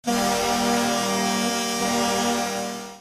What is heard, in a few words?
rangers horn; cstrike; mvp tracks